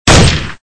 rifle; gun mayhem 2

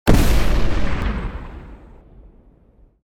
Shotgun; sunrider mask of arcadius

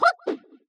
jump; sasuke

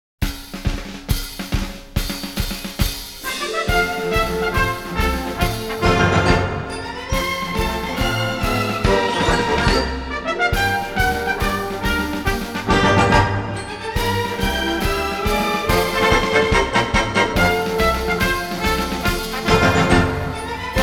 American Victory; Pacific Theater of Operations

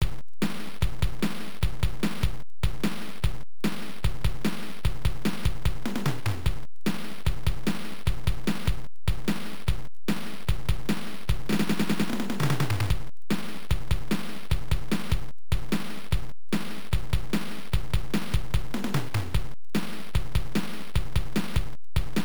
Clear Bonus; Dead Moon; TurboGrafx-16

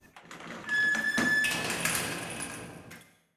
cage door

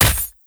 Attack Spear

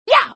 Mario Jump; Super Mario 63

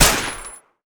AKSU

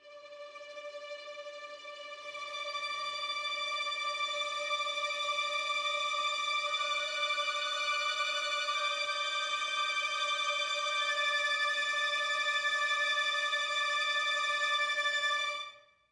String; Far Cry

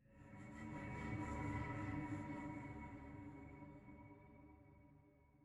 Ghost Pad

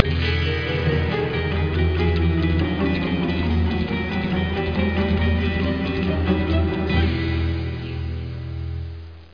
Tension Stinger; freddi fish 4 the case of the hogfish rustlers of briny gulch

